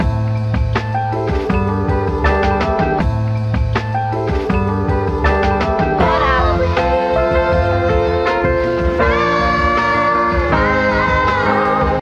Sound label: keys voice, loops, phonky